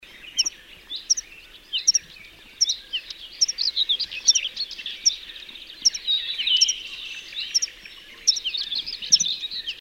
Antpra, Niittykirvinen / Meadow Pipit (Anthus pratensis); 17.6.2003 Ilmee, Karjalan tasavalta, Venäjä; Varoitusääni. / Karelia, Russia. Alarm call.